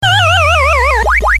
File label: Pacman Techno